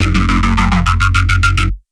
F# Beast, Serious Dubstep Bass Hits; Oneshots

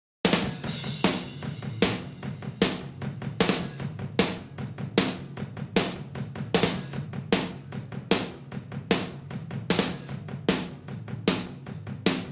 drums

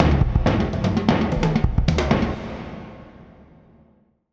Fills 109 bpm; ElectroSwing; WSL-ElectroSwing Vol 4 Additionals

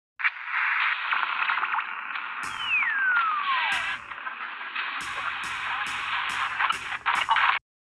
Additional Instruments; Multitrack

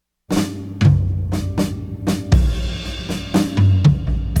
drums

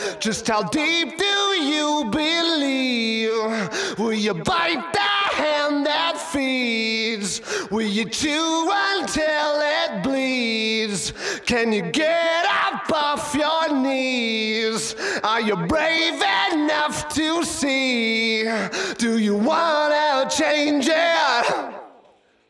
vox

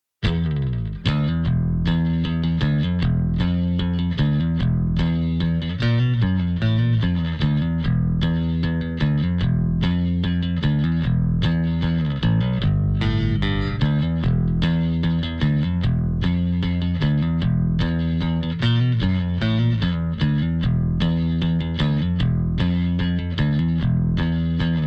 rhythm

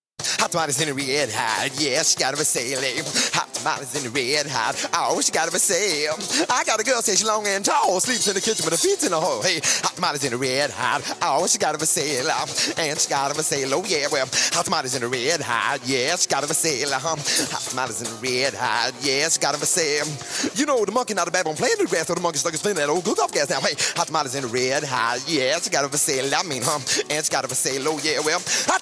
vocals

